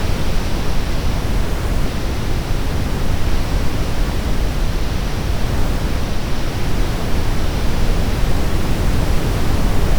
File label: Background Fire